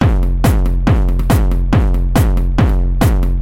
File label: gabba 140